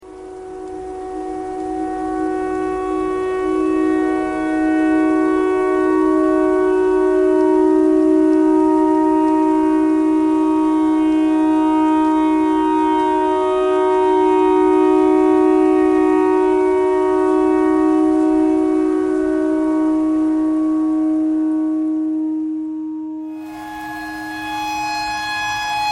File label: clarinet notes e tornado siren thread pentatonic